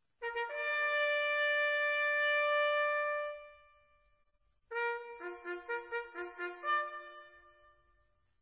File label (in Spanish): regimiento, corneta